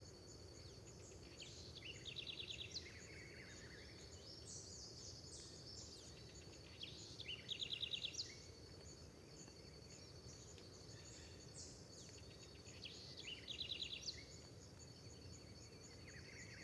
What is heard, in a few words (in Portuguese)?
Rolinha fogo apagou, periquito e outro passaro no parque terra ronca; aves